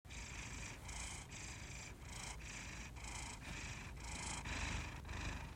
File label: kneading